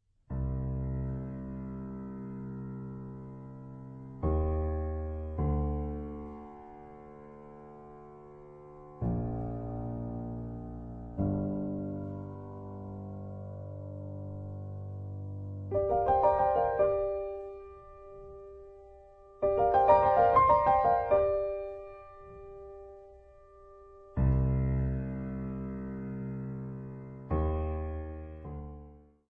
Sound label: Naked Piano; Opus